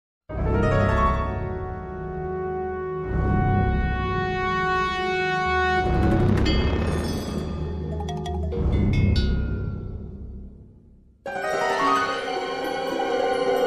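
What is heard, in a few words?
criminal music without words for background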